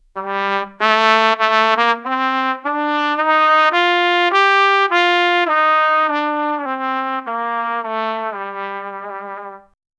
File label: a crative but long trumpe